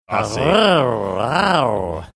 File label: making sexy rattle or purring sound